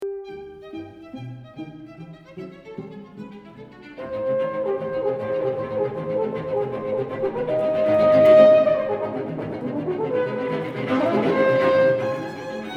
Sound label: Horn Quintet Molto Vivace